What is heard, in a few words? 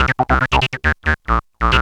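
sequence tekno; AMG; Now CD-ROM (Roland); Trance; G.T.M. SEQ 2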